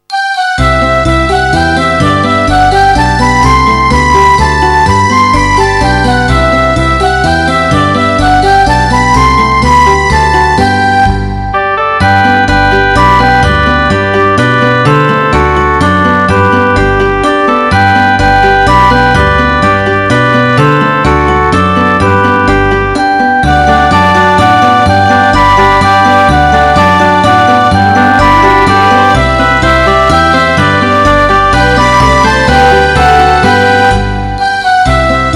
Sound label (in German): kinderlieder die gedanken sind frei playback